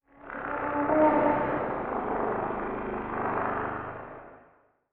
elder tiyanki idle vocal; Stellaris; distant stars

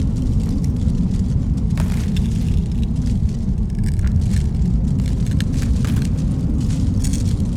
ambient large crystal station idle; Stellaris

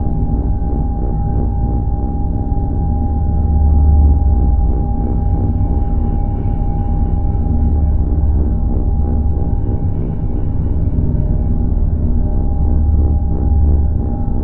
cruiser idle; caravaneer; Stellaris; megacorp

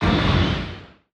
distance missile fire; weapons; Stellaris

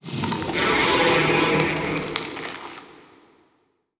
crystal, lithoids; lithoid portrait; Stellaris

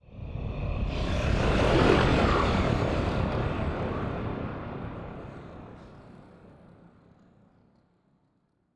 ship titan move; Stellaris; machineage

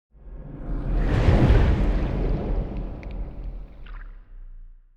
colossus move; aquatics

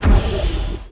energy torpedo fire; weapons; Stellaris

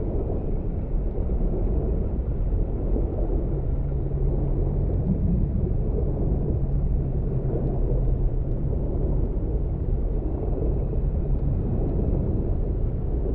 ship destroyer idle; aquatics

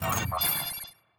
archeology log entry positive; ancient relics

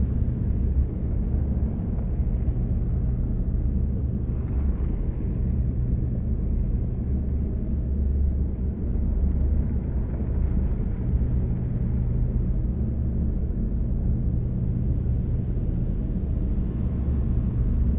titan idle; aquatics

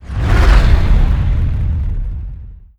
nemesis colony ship moving out; Stellaris

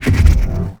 shield hit; Stellaris

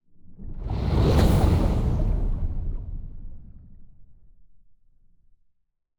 titan move; aquatics

